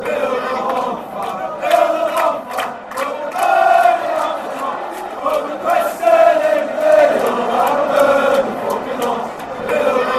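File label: build bonfire carlisle, football songs and soccer chants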